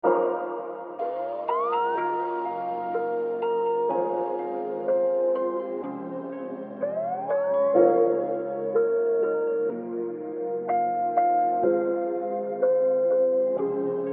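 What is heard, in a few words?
A minor 124 BPM Texture Plucks Keys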